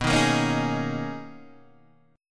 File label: B Major 13th B down Guitar Open D